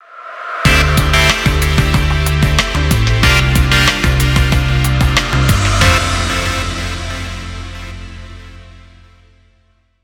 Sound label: Goal Jingle; Gran Turismo Sport; Transmission Mode; Christmas Extra